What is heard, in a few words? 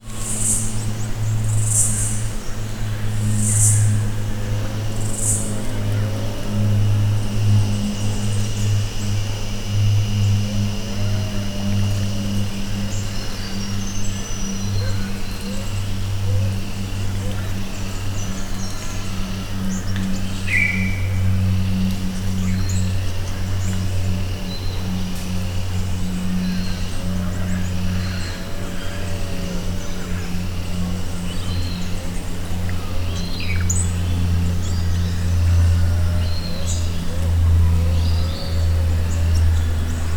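nature ambience forest australia birds insects plane overhead forestry works in distance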